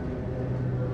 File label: motor, Vehicles; GT6M